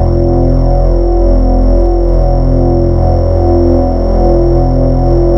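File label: Chill Table, Wavetables; Synthetic's Serum Collection; Cymatics - Chill Tables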